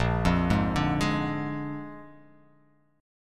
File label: A sharp 7th A sharp